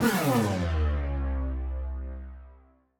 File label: Siren Horn down, Ramp Down, C; future rave